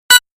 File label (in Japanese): ピッ音